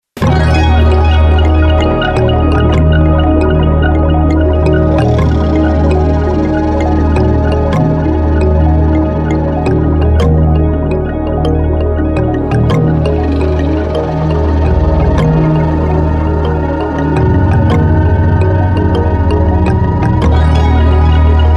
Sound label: Jungle Theme